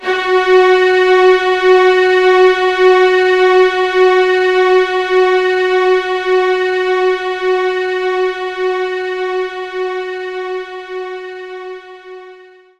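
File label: String Loop F sharp 3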